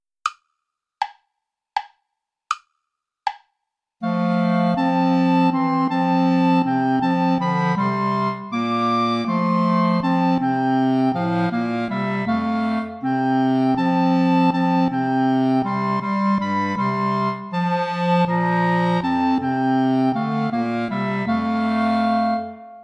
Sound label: play along without clarinet; herr jesu christ dich uns wend